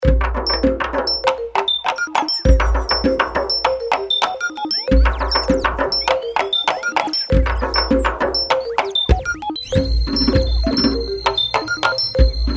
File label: Dumbek